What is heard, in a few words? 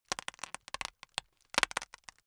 plastic pressured rustling slow